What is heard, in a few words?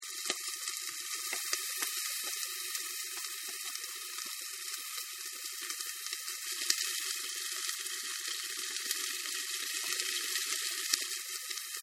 Sausage Fry; Food Prep Sound Effects; Restaurants